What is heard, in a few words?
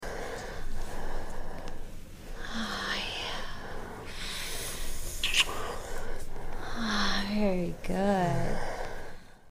lick